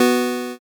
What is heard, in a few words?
power chord